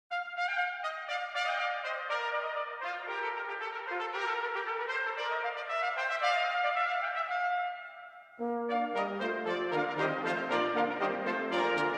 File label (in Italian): Canzona per Sonare; Gabrielli